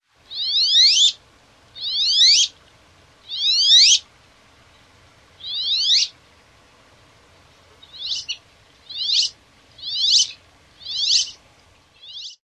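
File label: male squeals, Wood Duck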